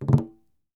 Conga - A; Percussion; Cymatics - Infinity Beta Pack 2.0; Zeus Percussion Collection

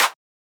Clap; Humble Divine Clap; Cymatics; Humble Hip Hop Sample Pack

